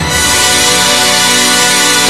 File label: MOVIE ENDHIT; Rubber Chicken Software Sample Bank